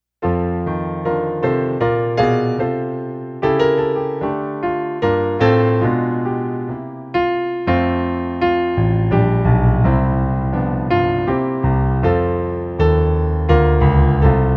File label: my country tis of thee - piano